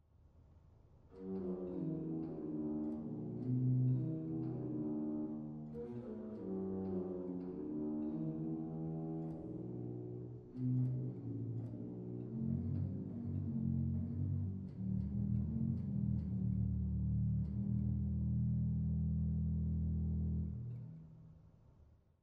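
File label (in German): Pedal Violon 16, organ; dortmund; Sauer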